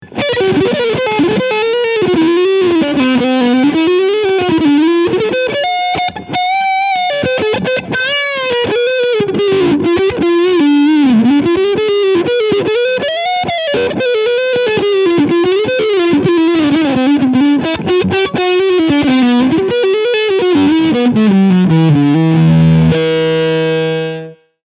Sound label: G&L Tribute Asat Classic Blues CAR Overdrive Front PU